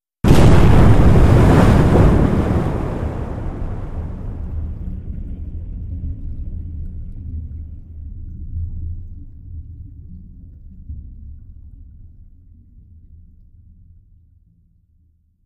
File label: Underwater Explo, explosions